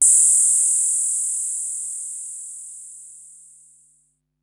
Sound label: Noise High, Korg Wavestation-SR Drum Kit